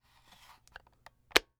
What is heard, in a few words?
Technology Electronic Remote Control Putting On Battery Cover